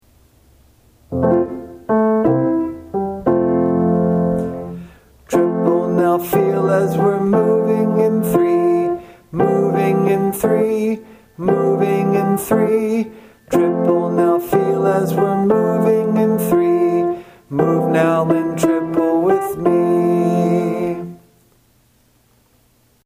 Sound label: Triple meter